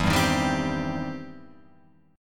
E Minor Major 7th Sharp 5th, E, down, Guitar, Open F; EmM7#5 strummed